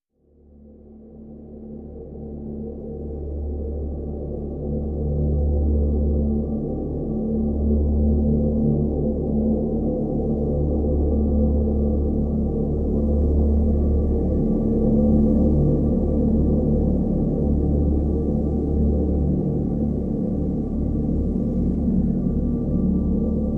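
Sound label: Drn D, drone; Drn River Crossing D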